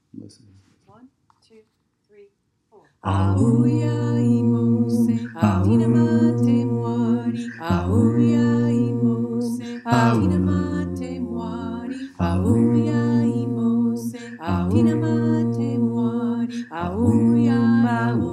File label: Bass Low